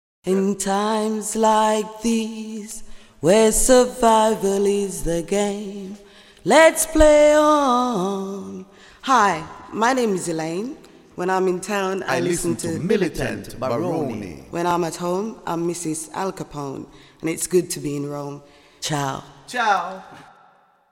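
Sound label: Jingle, reggae; Jingol Ciao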